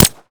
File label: fire supp, glock; weapons; Urban-Coalition; Decay; arccw ud